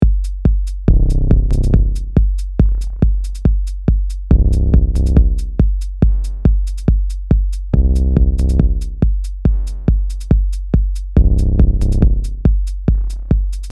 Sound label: E-Drum and synth